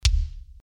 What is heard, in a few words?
kick